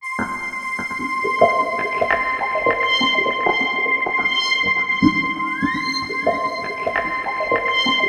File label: C6, SynthLine; 90 s Sample CDs; NiceBeats; SynthLine VOL-5